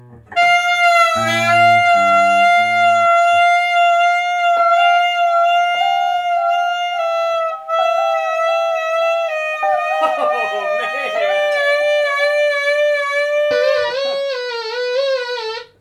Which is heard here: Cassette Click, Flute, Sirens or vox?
Sirens